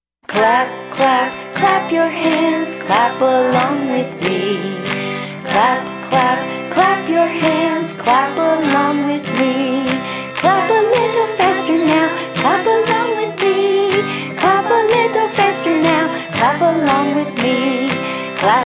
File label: clap clap clap your hands